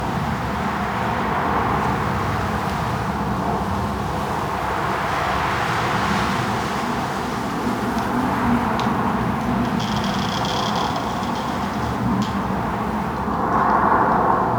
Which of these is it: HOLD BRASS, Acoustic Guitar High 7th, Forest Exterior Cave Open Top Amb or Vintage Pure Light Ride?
Forest Exterior Cave Open Top Amb